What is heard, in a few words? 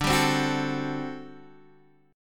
G Major 7th Suspended 2nd Suspended 4th D